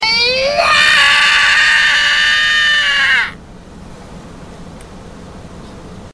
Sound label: Screaming